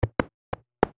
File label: soft impact; The NIW Project